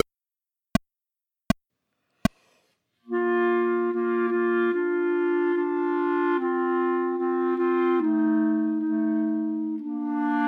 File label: Entracte Chamber Music for 3 Clarinets minus 1st part; Schubert